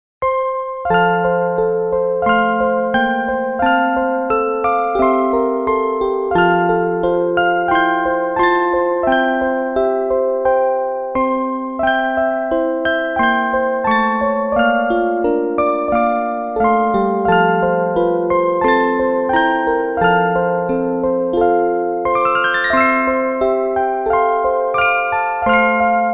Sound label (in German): orgel